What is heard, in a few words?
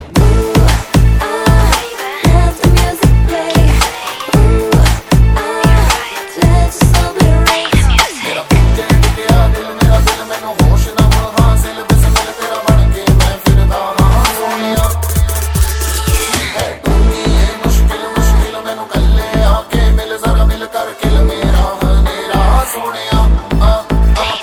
Cool Hindi Ringtones